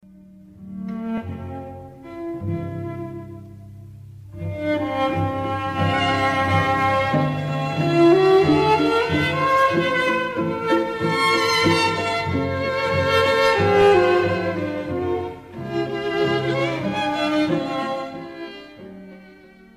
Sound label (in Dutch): strijken